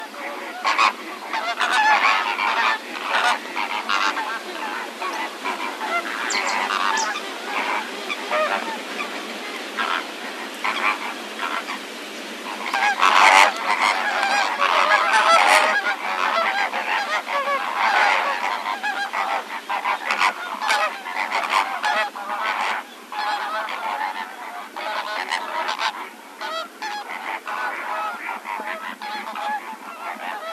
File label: Flamingo